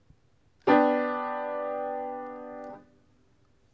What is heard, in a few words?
piano si re so